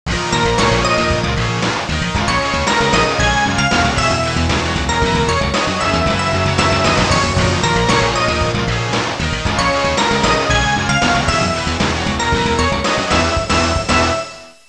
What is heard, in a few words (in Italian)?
suonerie musica rock